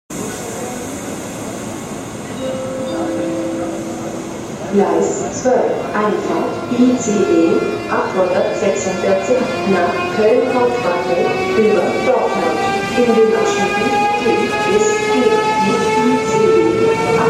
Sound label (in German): Anfahrtssound des neuen ICE 3 Siemens Velaro D; db baureihe 407